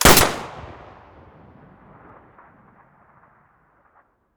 muzzle hpsa stereo, weapons

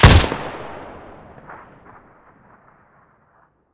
muzzle stereo, weapons; vpo101

